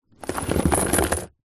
foley money coins in cardboard box move tip; zapsplat

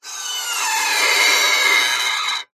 musical violin awful bow scrape nasty

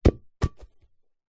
sport soccer ball flat light drop bounce grass; zapsplat

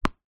sport tennis ball bounce hard court

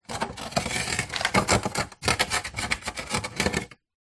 household freezer ice scrape from plastic shelf

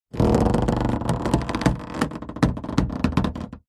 balloon inflated rub movement creak; foley

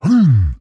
Monster Struggle Grunt; Screams Shouts 2; soundbits

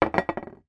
glass biscuit jar put down on table

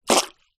human spit out water

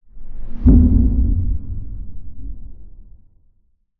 impact underwater or space soft shot; lukas tvrdon

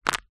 household plastic cup disposable handling pick up

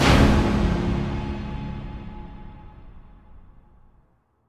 Impact Boom Orchestral no piano; bottlerocket

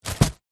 food wrapped loaf of bread down on surface; zapsplat

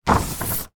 large poster on wall smooth over with hand

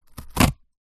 cardboard sharp object dig in cut; foley

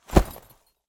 Whoosh Cloth Leather Fight; Just Whoosh 3; soundbits

